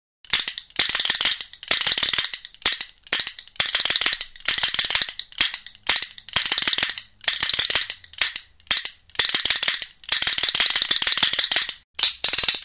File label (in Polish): Kastaniety